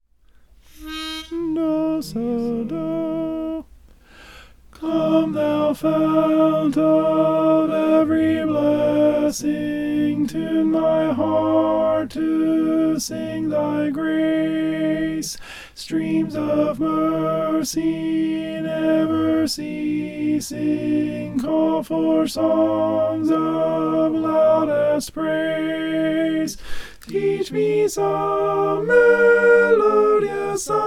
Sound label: alto; come thou fount